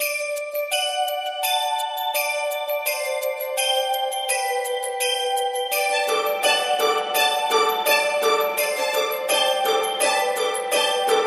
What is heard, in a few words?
Christmas String